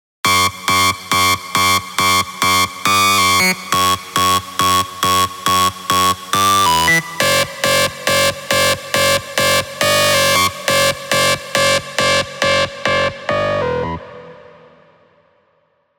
Hard Frequency; Revealed Serum 2 Big Room Techno Drops Vol. 4

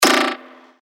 percussion; Gluten; revealed percussion vol 2

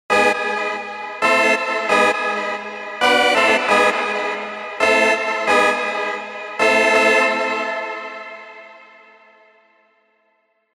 rave stabs; Praise The King; revealed spire rave stabs

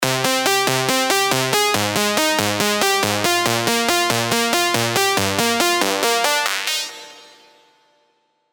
Three Triangles Complex; revealed serum 2 rave asylum

